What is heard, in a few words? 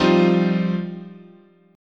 F 7th Suspended 2nd, guitar chord